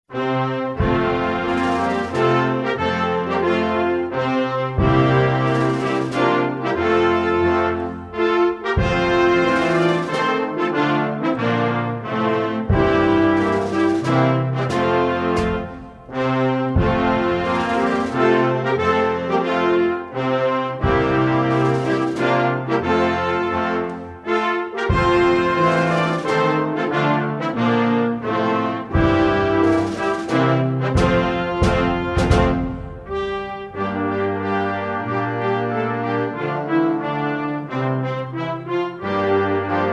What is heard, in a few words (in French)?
hymne national burkinafaso